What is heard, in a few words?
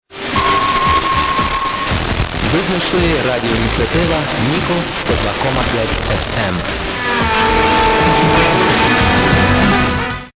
102.5 MHz